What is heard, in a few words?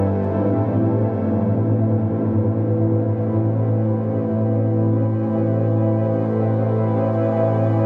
122 BPM Pads Cmaj Amin Tonic A, ambient synth pads